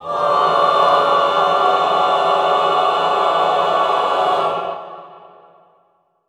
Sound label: classical choir, cluster mid; Best Service; Extended Classical Choir